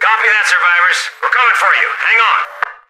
radio button, soldier; Left 4 Dead 2; Source Engine; video games; npc

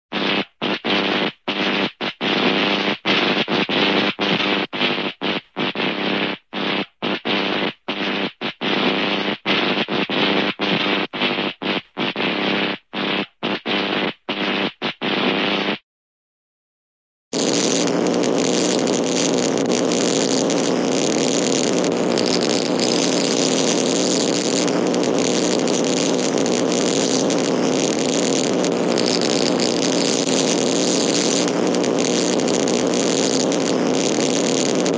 electricity electric spark gap; cartoon

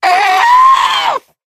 death, voice; video game; source engine; left 4 dead 2; spitter

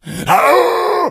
become enraged, infected

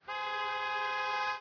car honk; ambient; Source Engine; cstrike

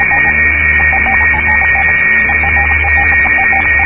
signal gear; ambience

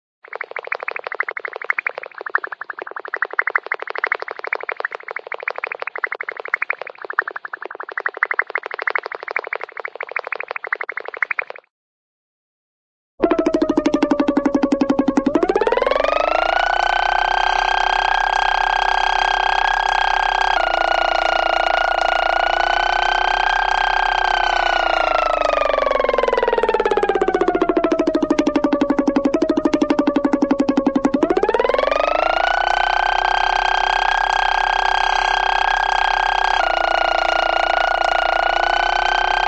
motor, cartoon lip motor; jetsons

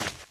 gravel, footsteps, run

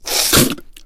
smoker reel tongue in, miss

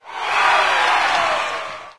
baseball minimize; sound schemes

